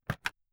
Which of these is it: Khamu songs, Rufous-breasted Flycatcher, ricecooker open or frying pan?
ricecooker open